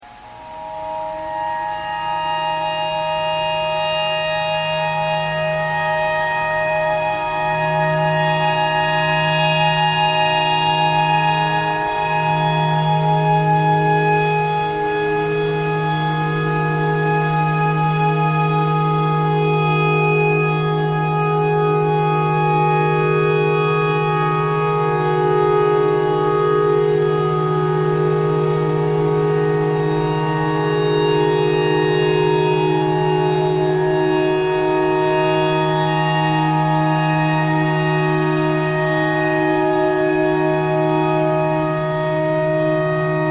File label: clarinet notes e tornado siren thread pentatonic